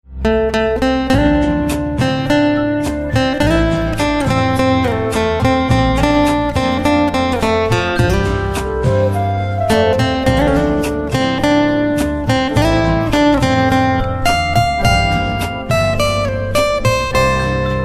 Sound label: Guitar